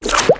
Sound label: pulldown